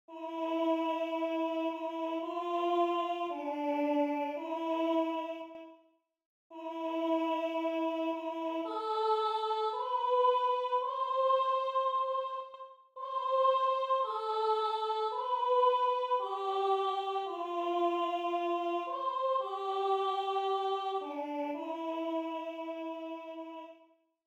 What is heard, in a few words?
Game Ending Song Soprano